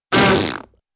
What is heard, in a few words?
honk and raspberry